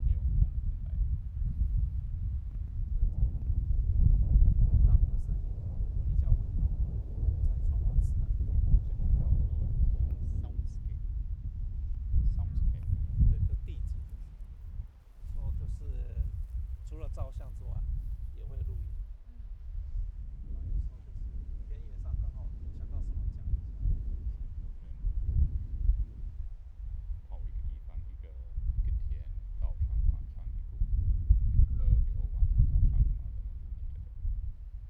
Ambience Jiangjunao Graveyard Nearby Scuba Diving Site